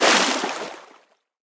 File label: pop out of water; Scrat